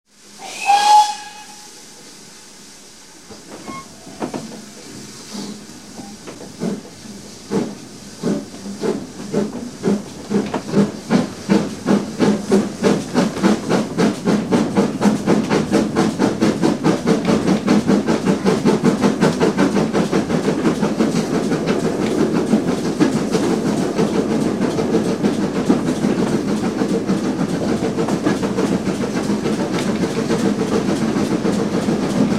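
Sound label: Steam Train Start Slow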